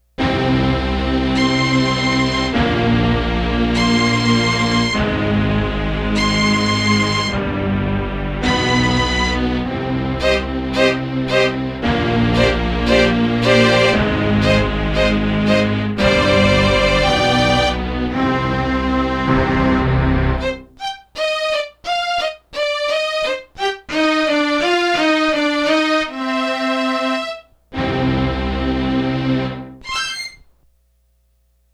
stacked strings high strings bowed

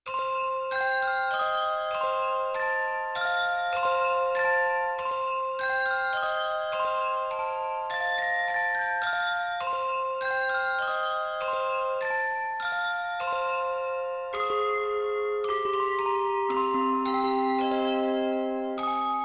Handbell Dual; Wind Chime & Handbell

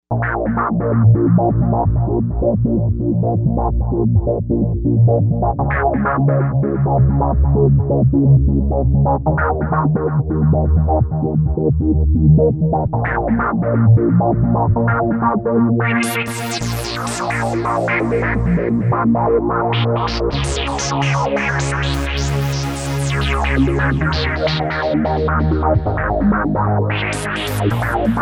Reso Wave Bass, Novation UltraNova; Factory Patches

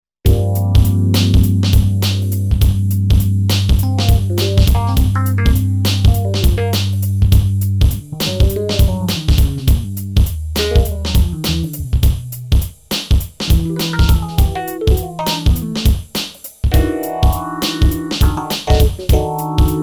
Future Jazztronic

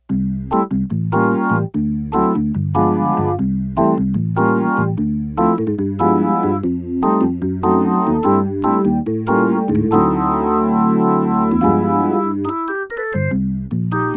drawbar organ

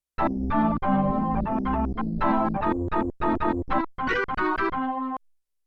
Hammond + Band; Yamaha TX16W; Big Band Performance

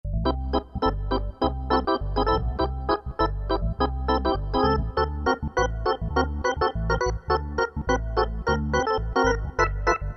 Gospel Organ, Kurzweil K2000; Kurzweil K2000 V3

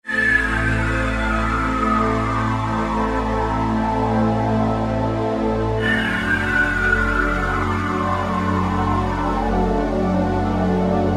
Air Strg, E-mu Morpheus; Factory Presets

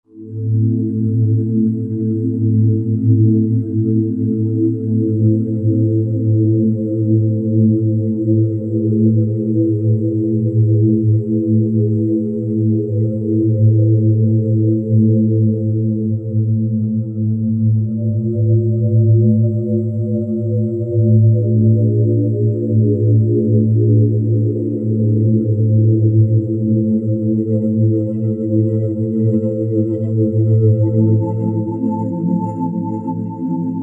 Shifted Glass, Roland JV-2080

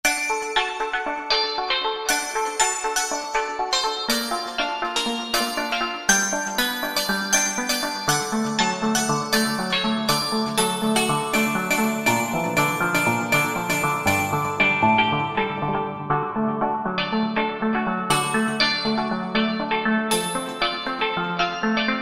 Alpha Waves; Roland SRX-05 Supreme Dance

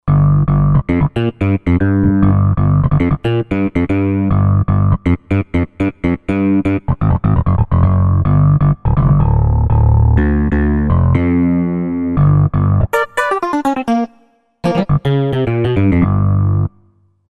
Boom Bass, Korg Wavestation; Korg Wavestation A-D; Skip's BoomBass

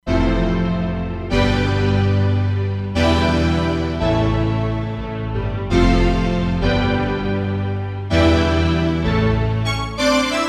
Gang Strangs; Roland Fantom XR

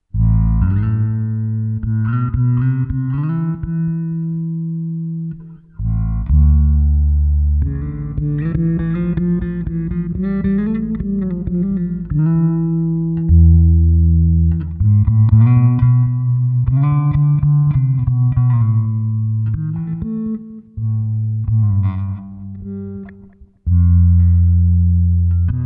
CLASSICAL, Zoom 506 II

